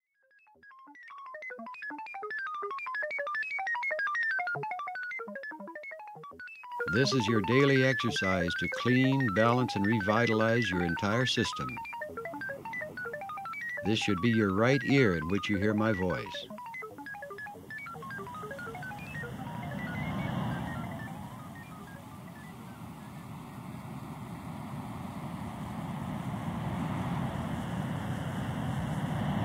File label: gate